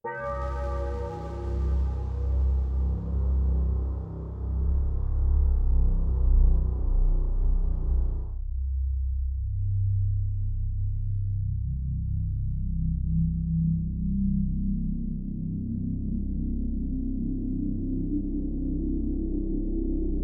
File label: ambient